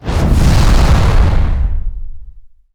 woosh

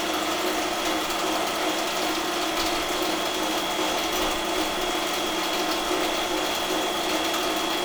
ambience

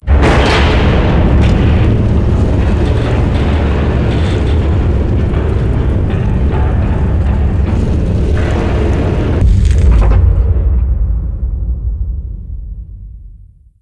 blast door close; dm backrooms complex

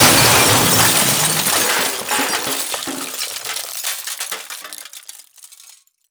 break

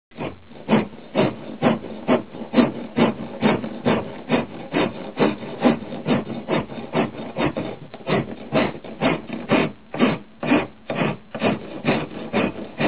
saw hand

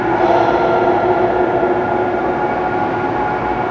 Tonal Loop

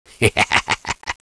laugh

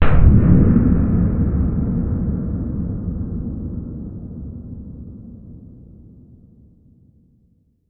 big hit far

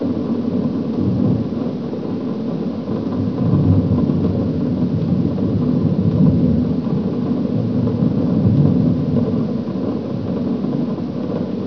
rumble

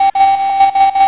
telegraph

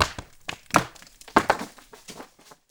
rock hit

